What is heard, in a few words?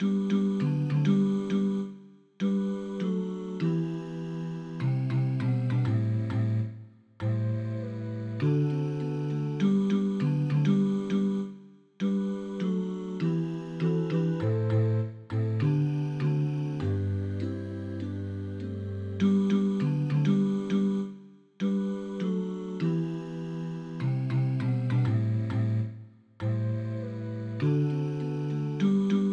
bass